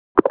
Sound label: connection, WiFi Phone; Netgear; SPH101